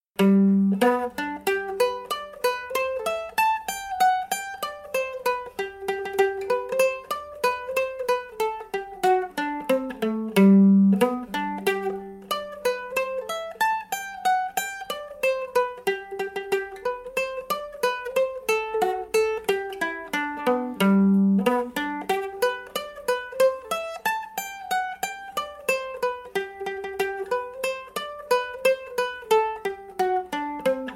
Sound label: banjo